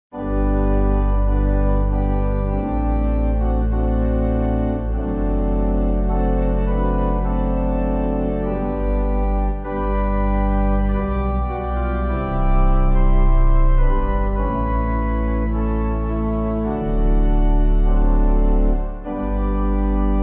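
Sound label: Pipe Organ; Nearer My God; American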